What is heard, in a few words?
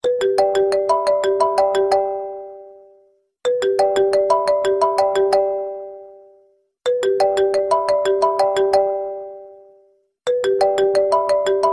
samsung ringtone